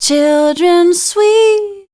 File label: voice spectral, ly short